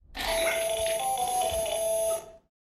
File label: ring